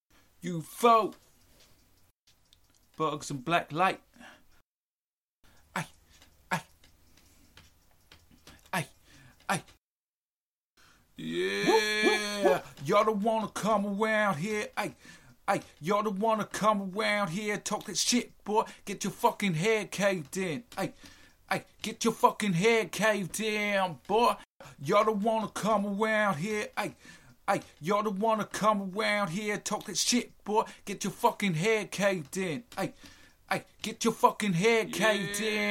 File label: 156BPM Key Am, Acapellas